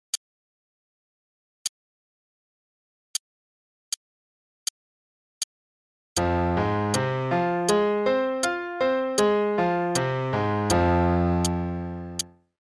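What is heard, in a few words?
Arpeggios (f minor) (quarter note=80); CM Level 5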